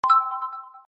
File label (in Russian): Realme уведомление; на смс, стандартные, звуки, короткие, простые, зарубежные